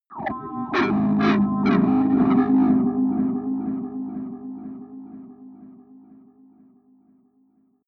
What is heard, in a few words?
atmospheric feedback distortion short, drones